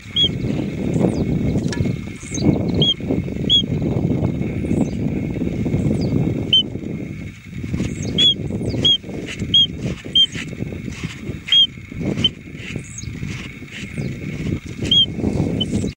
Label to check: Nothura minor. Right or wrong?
Right